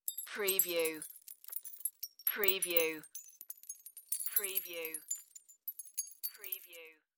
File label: Debris Glass Drop